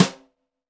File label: Brush Slap, snares